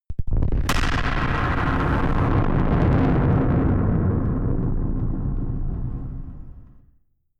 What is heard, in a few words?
distorted explosions